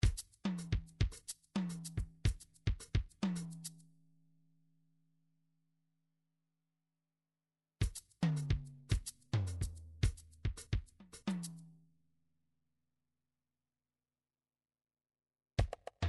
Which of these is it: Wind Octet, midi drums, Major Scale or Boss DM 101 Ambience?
midi drums